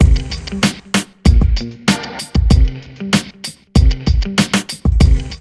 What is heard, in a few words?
BEAT; USB Soundscan vol.19